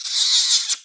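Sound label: attack heavy; squill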